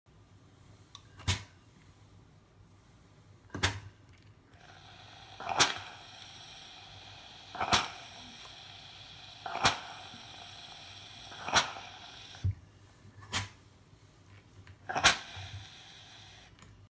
steam iron

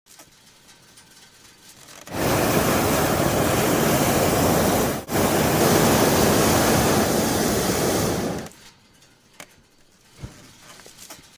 Hot Air Balloon

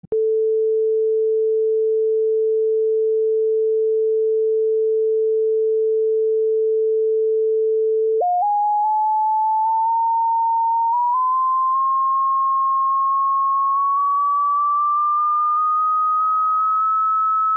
Water coming out of the phone

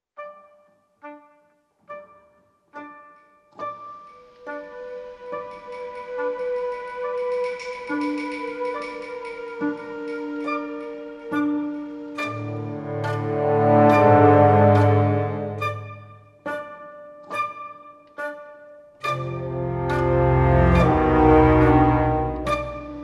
The Organ Grinder; Mank (Original Musical Score); Soundtrack

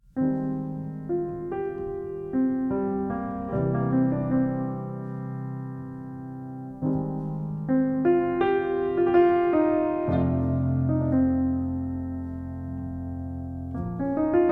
Solo Piano; Harold and the Purple Crayon

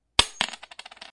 coin drop

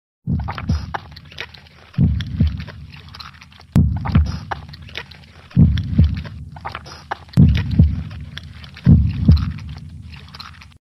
blood flow

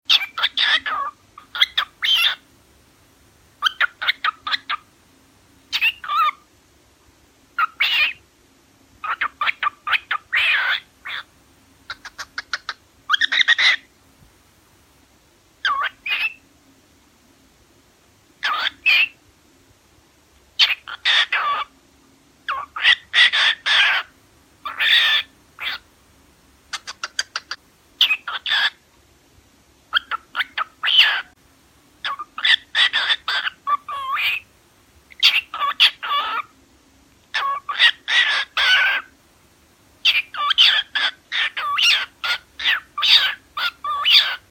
cockatiel; chiko

